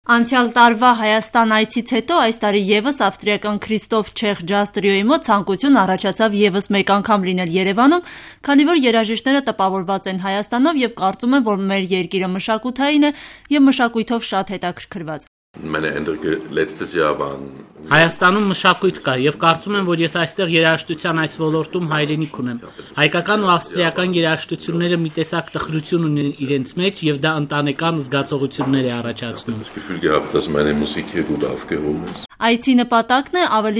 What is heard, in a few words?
jazz